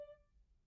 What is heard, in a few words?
Grand Piano Main Release D4, Kawai; Chordophones; Releases; Legacy